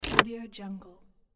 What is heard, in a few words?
lever pull